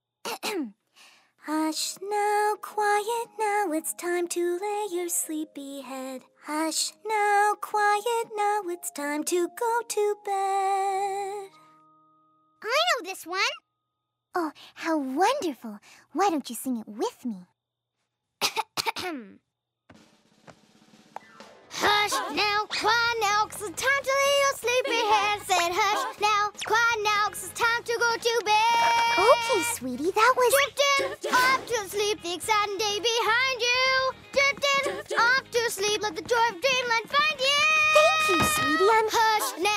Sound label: Acapella